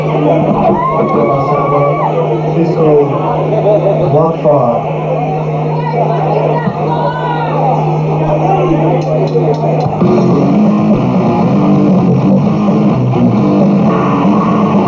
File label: Blood Fart